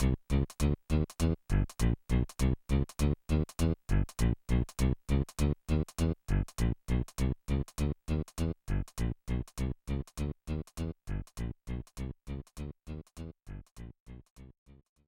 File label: Minigame (Roulette); super mario maker 2; Super World - Toad House Minigame